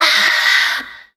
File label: ah, Voice ooh ahh